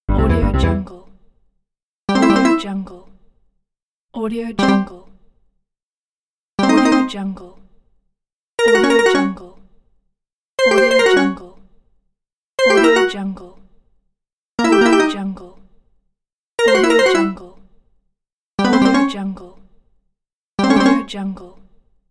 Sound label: notifications